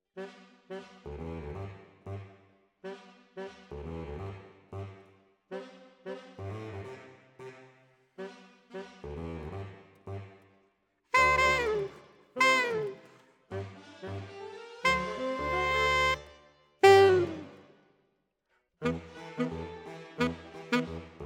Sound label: Alto, RNR for piano